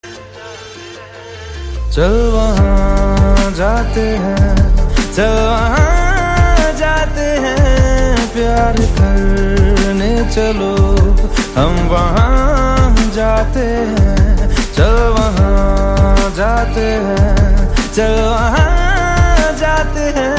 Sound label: melody as ringtone